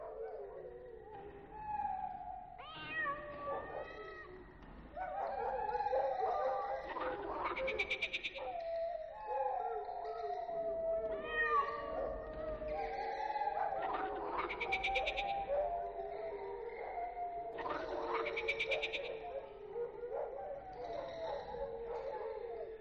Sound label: animal sounds